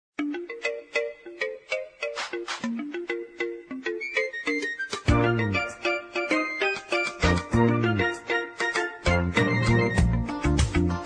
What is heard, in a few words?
instrumental